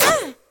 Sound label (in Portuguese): Dano